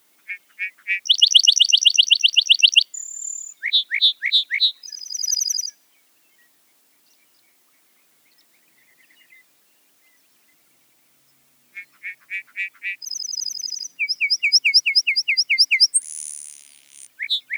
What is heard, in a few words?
Lark Bunting